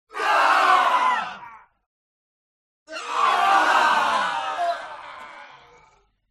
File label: HUMAN CROWD Death Cry; smartsound